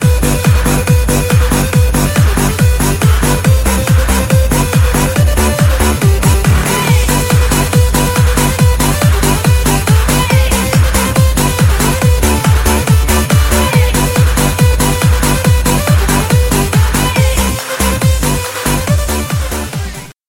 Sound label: jingle bells techno